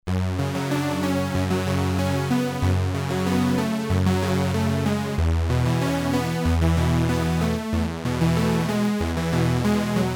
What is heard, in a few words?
synt obx, kawai k1r